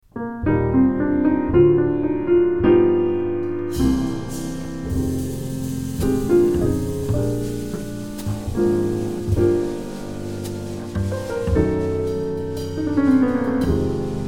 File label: minus melody